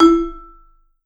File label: Vibes; Sampleheads - Dave Samuels Marimba & Vibes